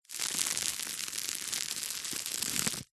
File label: office parcel tape strip stuck to carpet finger rub along